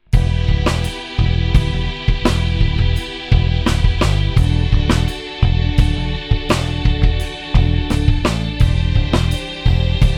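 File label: solo mellotron loop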